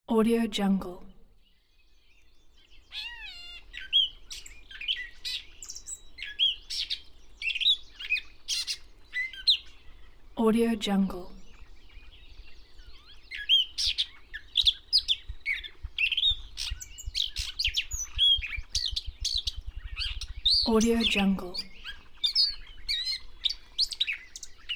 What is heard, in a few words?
forest birds